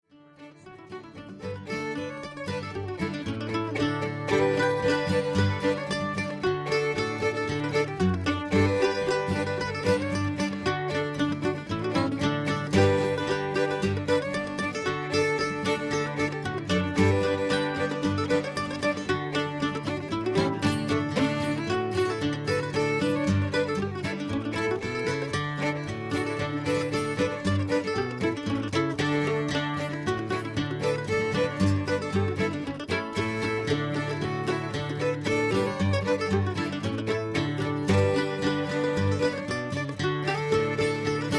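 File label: String Band Road To California; Bowdoinham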